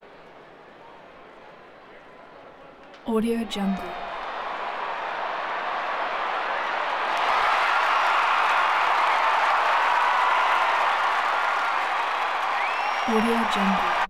baseball crowd bat crack hit big swell

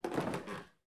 footsteps wood creak stair case; collanderson

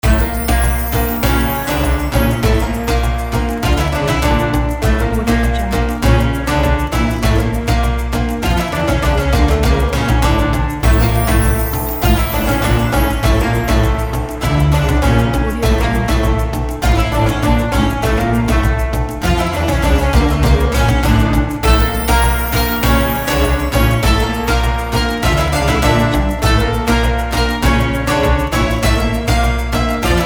arabian

